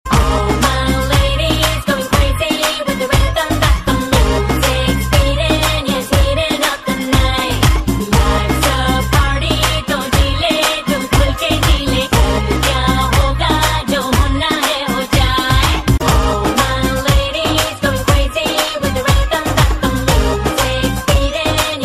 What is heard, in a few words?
Girl Voice